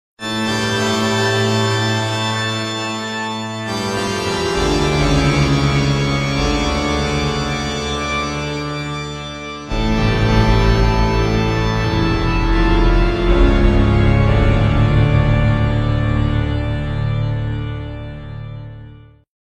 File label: Scary Organ